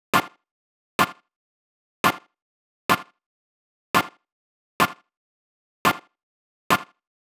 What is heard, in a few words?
126BPM Cutted, tech house; Connectors; Vengeance Samples; Bonus TechHouse Kits